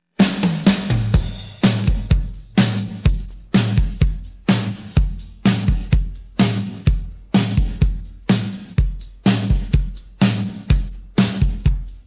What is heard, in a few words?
pattern, drums